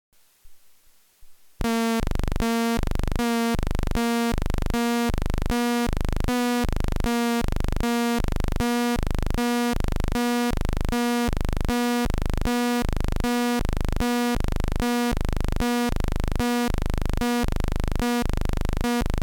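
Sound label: Korg Monotron Delay square pulse block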